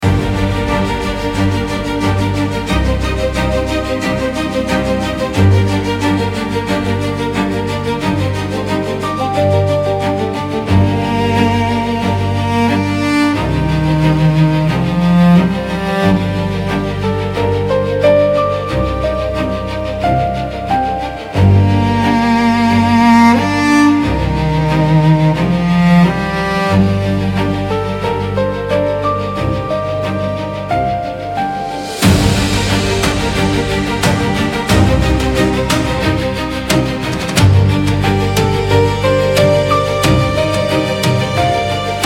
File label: dramatic music emotional trailer